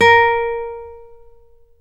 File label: GTR CLS GTR, Nylon String Classical